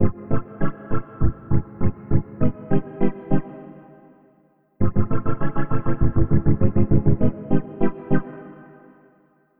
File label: Synth Chords; Eclectic Electronica